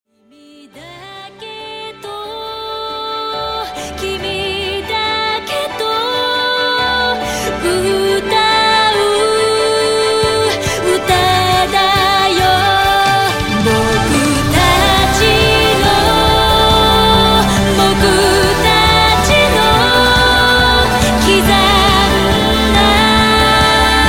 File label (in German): Anime Musik